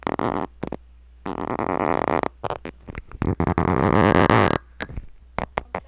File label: farts